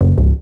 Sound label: Hit Fail; Arcade